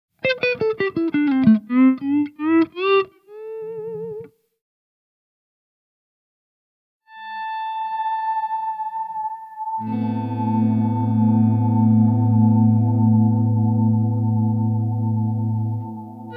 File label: Vintage Oil Can Echo, High Time and Regeneration values create a wash that almost feels looped.; Doggie / Pedal; Olivera